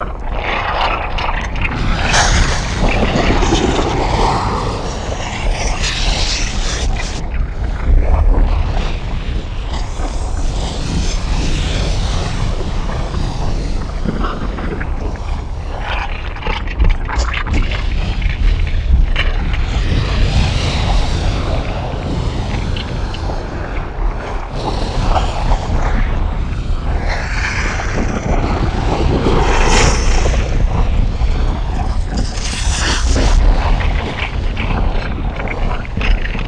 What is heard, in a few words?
lava; Earth and Beyond; EA Games